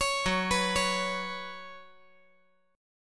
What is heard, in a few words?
Gb Suspended 4th Gb, down